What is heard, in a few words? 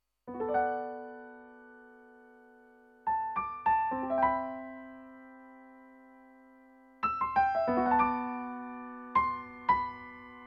Piano